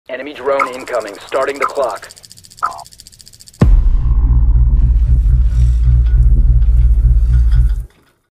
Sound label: drone sound; rainbow six siege; lion; rb6 lion